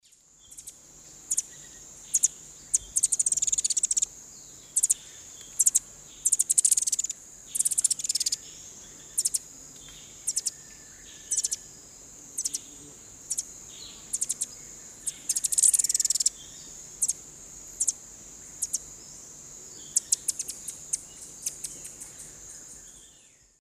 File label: Thlypopsis sordida, song and chatter; S. America; Eastern Lowlands